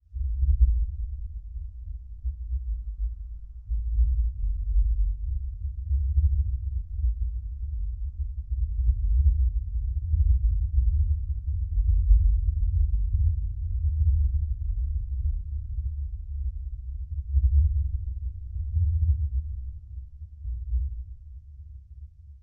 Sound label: Explosion distant rumble